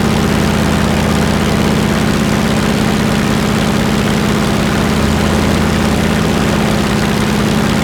plane; World of Tanks

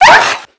dog pain

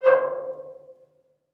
ambient battle; World Of Warships

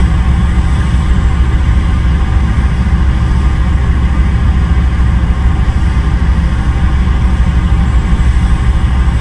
jet cockpit l, engines; War Thunder

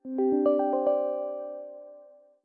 Windows Notify Calendar; Civilization 3

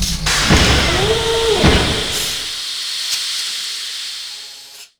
jump charge

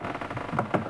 Boat Creak; Civ4 warlords

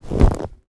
padding walk

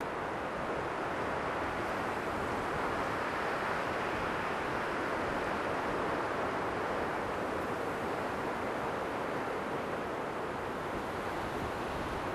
wind sea; ambient; World of Tanks Blitz

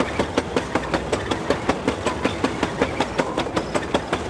treads tractor; bt7; World of Tanks Blitz

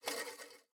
spinner wheel spin accent; Rust

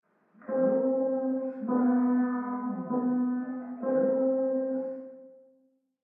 ambient port; World Of Warships; port3d

